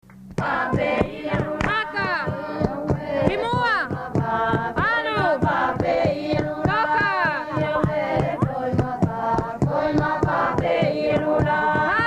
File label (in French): mua choeur